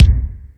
Lotsa Kicks, Drum Machines E-MU Pro-Cussion

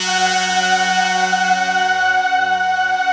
rough stack

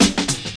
Loop, Roland; Sound & Vision - Gigapack I

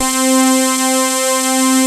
PRO 5 SYNS; Pro-Rec - Synthsonic

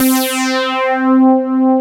WET BASS C5; WEATYBASS; Synth

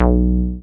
303, TB-303 BASS

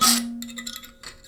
Finger Stash Hit, Prepared Kit; Battery 3 Sample Kits

